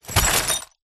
broken glass pieces in cardboard box set down hard on ground; zapsplat